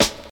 00s Smooth Hip-Hop Snare One Shot C# Key, Royality free snare sound tuned to the C# note